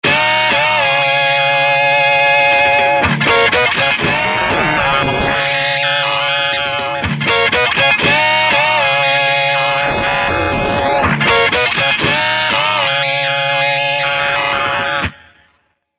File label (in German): E Gitarre Beefy Digits